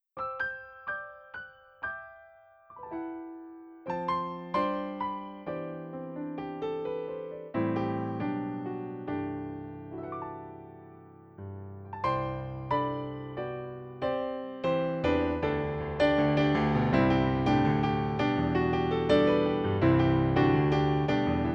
Concert Grand, Yamaha NP-15 pianino cyfrowe; Yamaha NP-15 WH

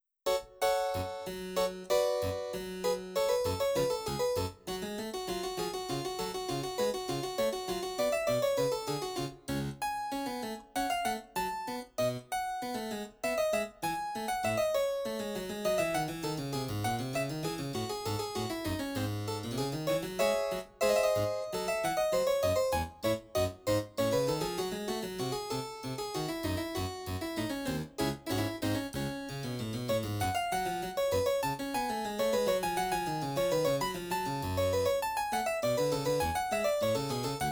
Harpsichord 8, Yamaha NP-15 pianino cyfrowe; Yamaha NP-15 WH

